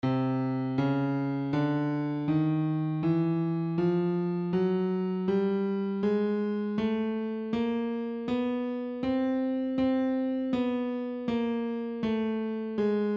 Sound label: Chromatic C